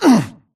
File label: grunt hits; Titanfall 2